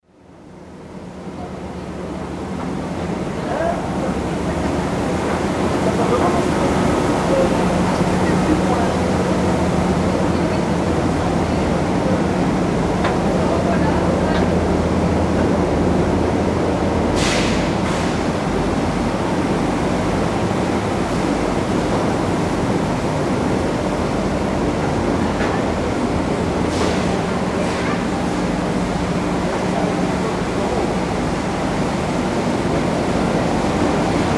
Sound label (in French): moulin; mz-n710; moulin marion